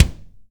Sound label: KICK MONO, Ensoniq ZR-76; 300 Drum Machines